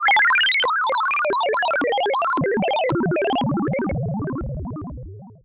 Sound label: 8bit computer